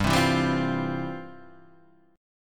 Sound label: F Major 7th Suspended 2nd